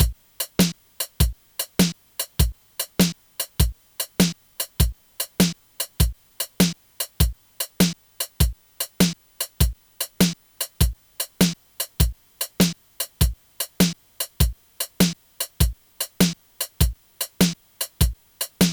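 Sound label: drum pattern swing; Music-X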